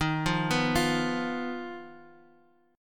D sharp Suspended 2nd Sharp 5th D sharp, Guitar Drop D; x,6,3,4,6,x; chordshape